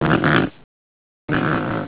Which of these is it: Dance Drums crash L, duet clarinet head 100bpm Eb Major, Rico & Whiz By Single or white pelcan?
white pelcan